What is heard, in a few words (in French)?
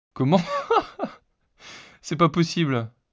rire naturel